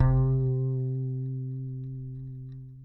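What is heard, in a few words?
acoustic bass vibrato C 3 left; Masterbits CLIMAX 7 - Bass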